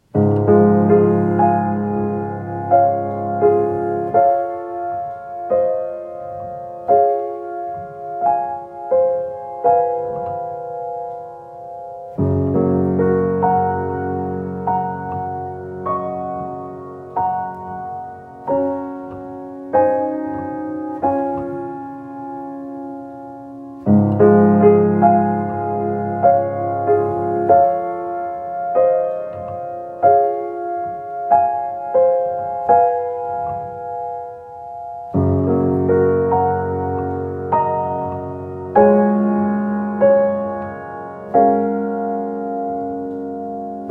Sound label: piano